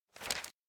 书店内 生死簿翻页; 序章

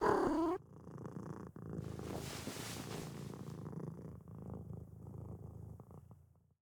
猫猫钻盒子

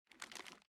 收起纸条

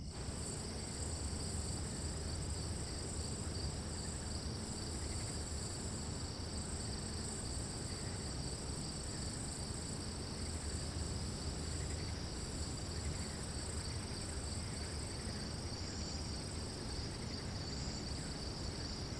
室外院子, 环境音

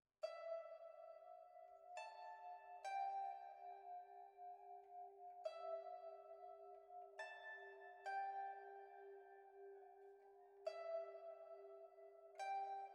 摇啊摇诡异 pipa

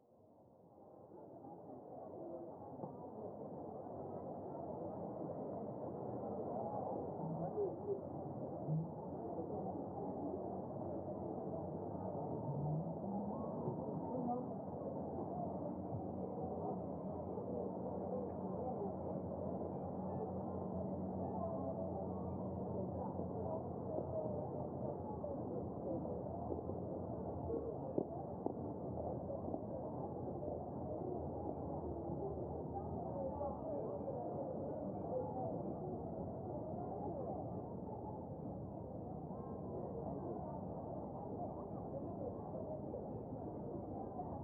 人声背景音

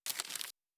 寻人启事互动音

